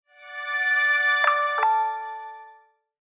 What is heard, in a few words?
Doors